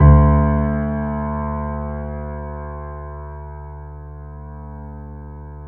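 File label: D2, grand piano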